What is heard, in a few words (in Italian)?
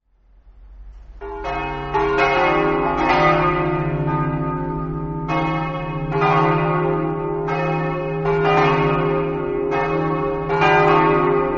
povoletto, campane